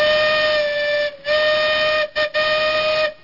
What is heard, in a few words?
steam engine whistle